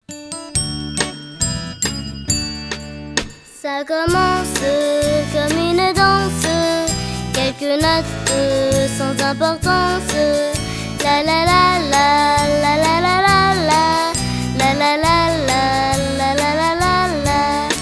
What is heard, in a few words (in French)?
silence